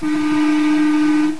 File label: klaxon, Train cl314; OpenBVE